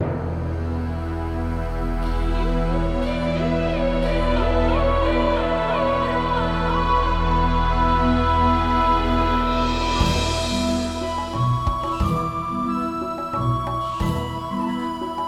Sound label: Open Water; Ocean Side